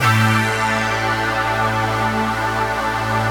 Perc Brass Pad

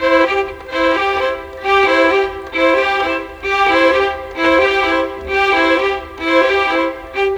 Str Violin, Cinematic; Sonic Foundry (Sony Creative Software); Orchestral Series VOL-3 - Cinematic

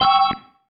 Stab, Organ, One Shots

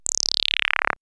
Alien Minor Scale, sci fi synth fx; X-Static Goldmine

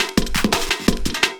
LOOP, 170 BPM; Ueberschall; Drum & Bass Flash Trackz

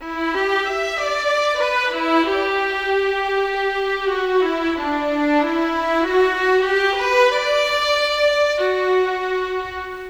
Cello, Violins, Orchestral, Rock Pop; Sonic Foundry (Sony Creative Software); Orchestral Series VOL-4 - Rock and Pop

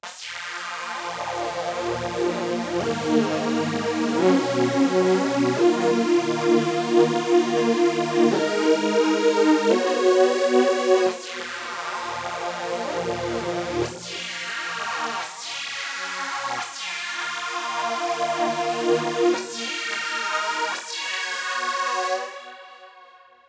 Melodics; neuro; Optiv & BTK

